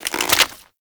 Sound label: Embolist Reload, Infested Pistol, Weapons